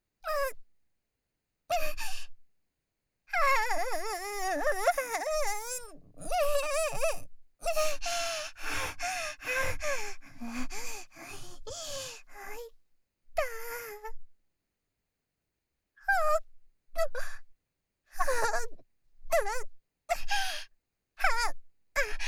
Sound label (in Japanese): 喘ぎ（SEなし）喘息